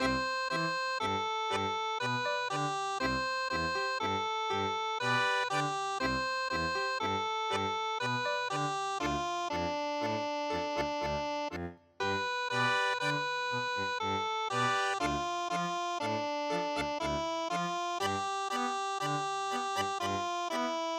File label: Cielito Lindo tango